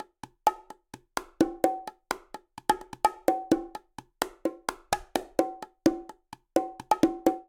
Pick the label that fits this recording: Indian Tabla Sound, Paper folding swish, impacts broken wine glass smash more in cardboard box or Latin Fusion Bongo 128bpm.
Latin Fusion Bongo 128bpm